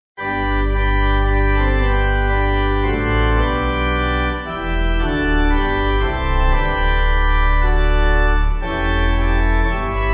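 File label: Pipe, Pipe Organ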